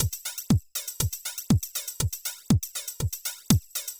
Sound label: House Drum NoSD Flanger